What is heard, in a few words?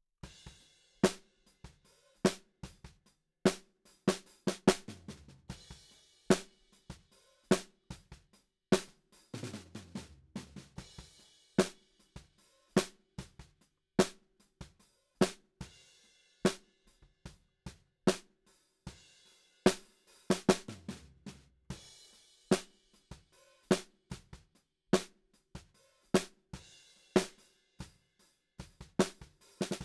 Snare Bot